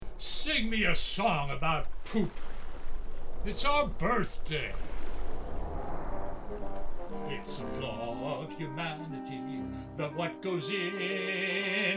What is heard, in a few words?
audition singing with voice